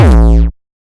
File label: Happy Clappers, Kick Drums; Zero-G; Soundclash